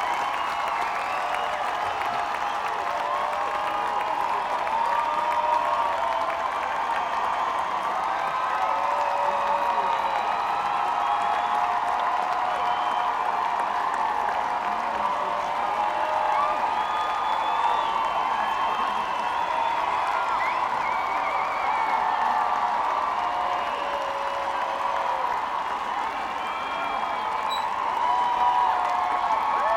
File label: encore break